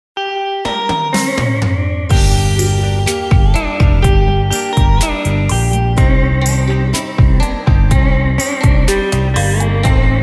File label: beat, playback